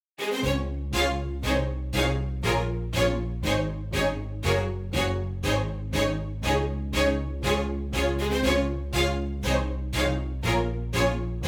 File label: backing track